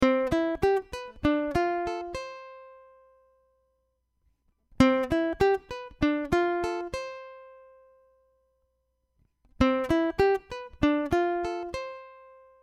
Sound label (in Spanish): C Escala